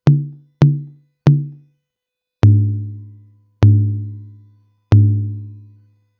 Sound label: beat